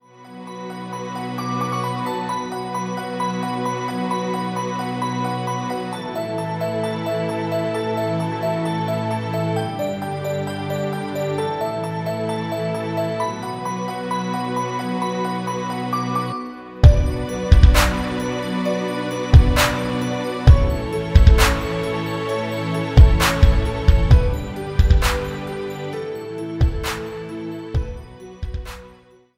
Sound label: instrumental ringtone